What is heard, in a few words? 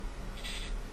emf buzz